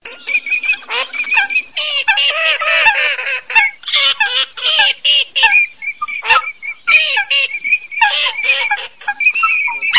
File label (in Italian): alzavola folaga, birdsound